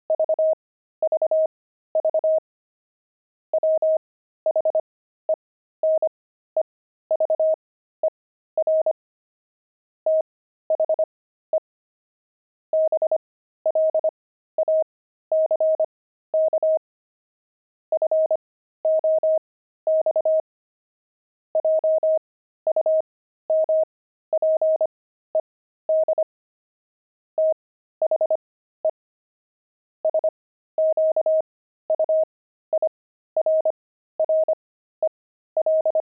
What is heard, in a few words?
pangram, intermediate pangrams files